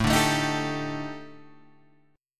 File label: D sharp Major 7th Flat 5th A, chord